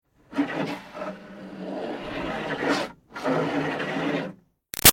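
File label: Water Spray Interior Car By Hood Sequence; Car Wash; BigRoomSound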